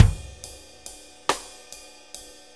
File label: 6-8 Loop, Full Kit Loops; Drum Tools